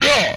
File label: hit, vocal; retro arcade; MMRetroArcadeSoundsPack